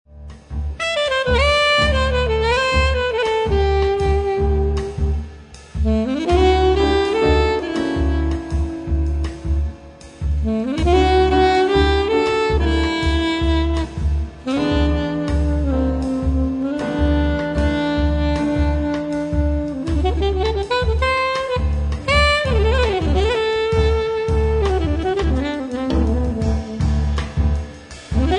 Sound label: ceremonial